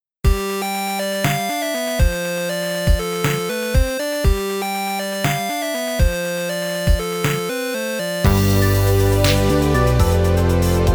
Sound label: retro electro